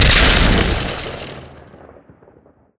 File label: shockwave shoot release; mechanical units; Half Life Alyx